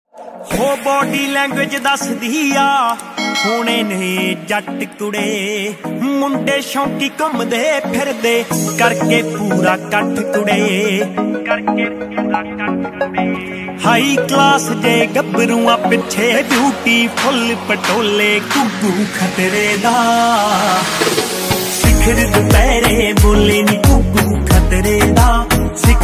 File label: Punjabi Tune Ringtone